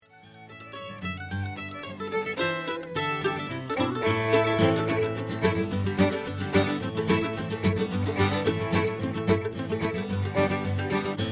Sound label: String Band Grumbling Growling; French Canadian tune, recorded at a dance; wedding; Bowdoinham String Band